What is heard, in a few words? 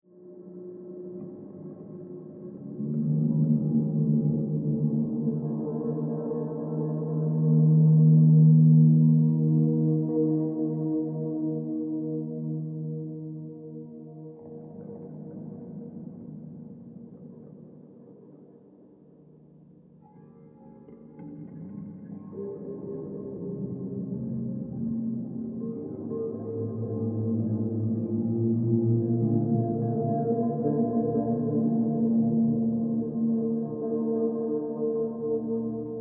Ambient, spacey guitar drone